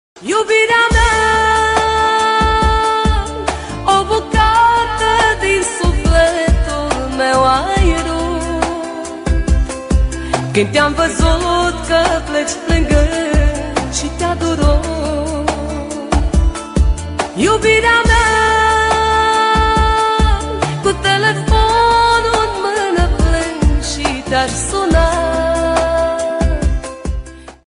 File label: sad song